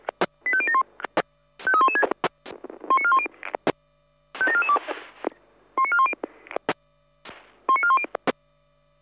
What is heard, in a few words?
Squelch